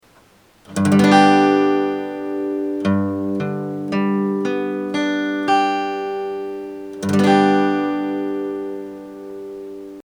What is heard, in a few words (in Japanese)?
G バレーコード